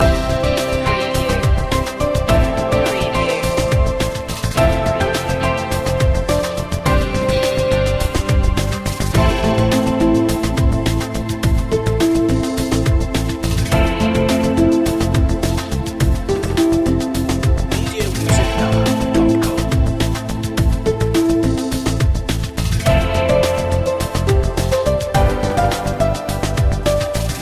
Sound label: corporate heart loop